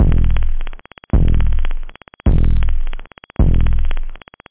acid, drums technobd; da capo vol1

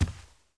step; KINGPIN; player

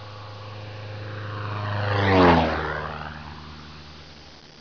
swoop; Image Bonanza; Expert Software; military

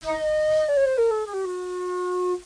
FLUTE

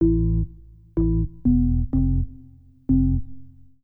organ house 125 A; eJay; Keys

